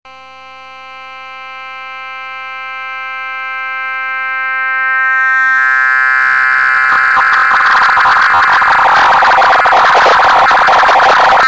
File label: 480 resonance up